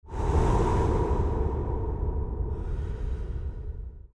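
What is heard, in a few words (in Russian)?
дыхание вселенной; Звук ветра для перехода в видео или для монтажного перехода в аудио монтаже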